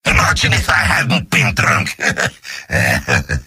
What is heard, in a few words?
cheers; demoman; video games; source engine; tf; mvm